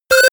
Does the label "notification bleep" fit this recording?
Yes